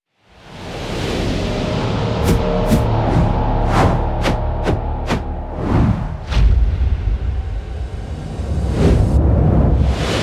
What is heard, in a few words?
transition whooshes